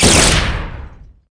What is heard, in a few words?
sword